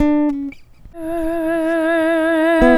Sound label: Vocal Guitar FX; Sonic Foundry; Rondo Brothers Trip-Hop Loops & Samples; Hooked On Hookers